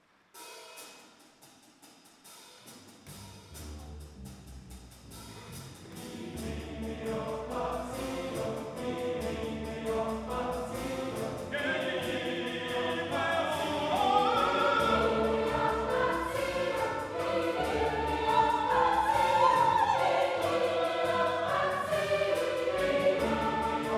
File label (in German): Chor mit Tenor und Sopran; Blarr